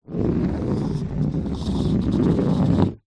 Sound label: massage roller ball roll on wood